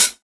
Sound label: studio pedal hi hat sound B key, hat sound tuned to the B note